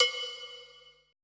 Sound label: COWBELL B2, PERC; BPC PERC